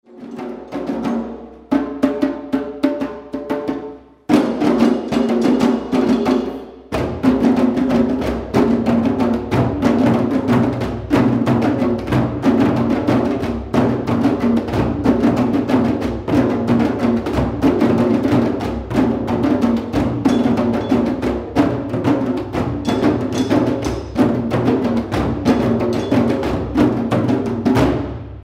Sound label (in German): trommler, Djembé, Surdos, Congas; trommelgruppe